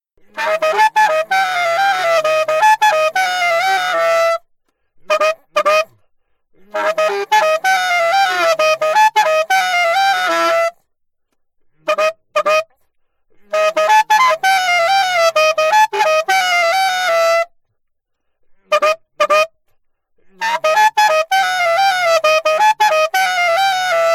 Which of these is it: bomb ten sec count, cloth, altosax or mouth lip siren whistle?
altosax